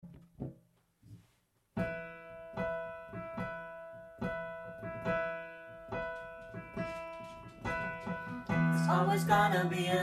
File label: SOP BAS